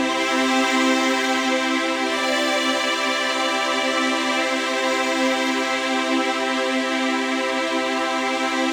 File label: Herbal Strings, струнные